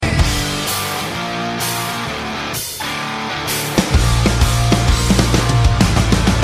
guitar noice

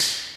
weak punch; undertale

